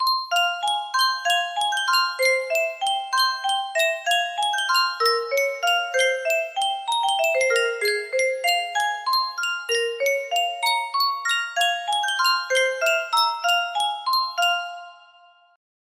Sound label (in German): Yunsheng Spieluhr